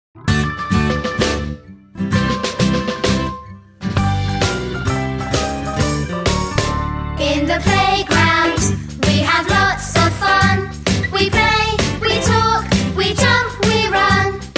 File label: karaoke